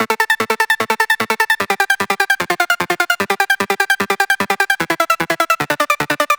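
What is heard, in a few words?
8Bit 150BPM Arp Loop A minor; Antidote Advent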